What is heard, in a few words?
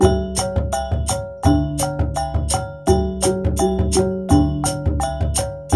WYANG L, Heart of Asia